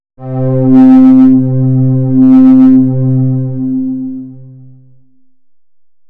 stringy, Synth

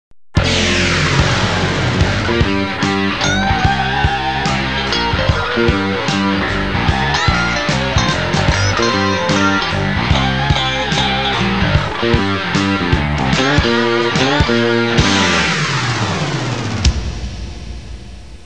intro music; Barely 18

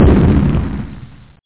BLOWUP

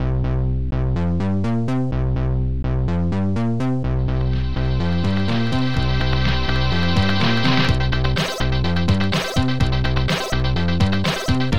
chip song; Digital Symphony Collection

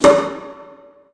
shot; VirtuaTennis

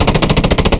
CANNON; Team Alligator

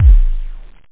FATDRUM; Groovy Bytes: Behind the Moon; DRM PERC

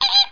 bird; FishSim

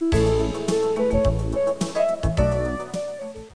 tv music

